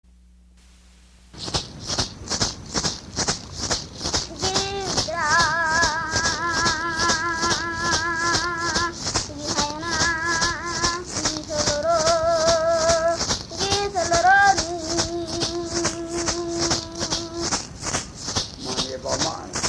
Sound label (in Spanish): Canto Guaymi, musica indigena; a la plata